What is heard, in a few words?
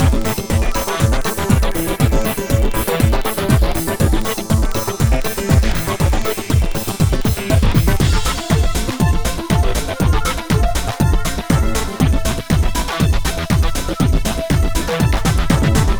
Techno; balloon rain; Music